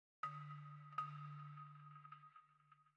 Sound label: Balafon